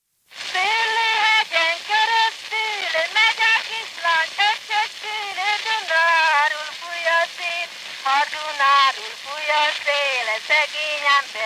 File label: Improvisation